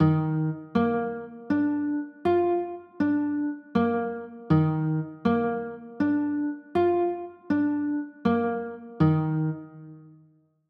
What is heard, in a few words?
e flat maj, chords; e flat maj9